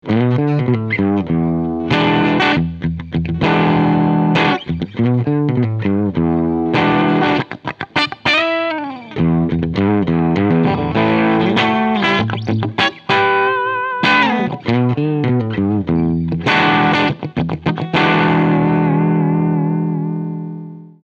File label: Mellow Bright Fullgain